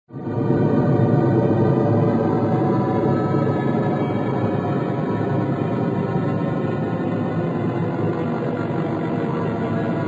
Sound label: Ambient, thriller-horror ambience sound